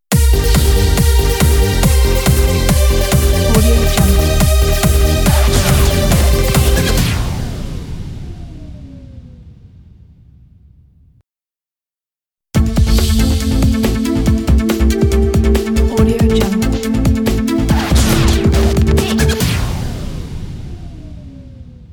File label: fashion idents pack